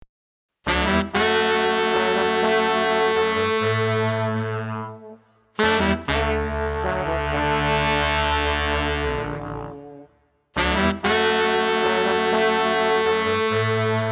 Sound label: Street Brass Combo Groggy 97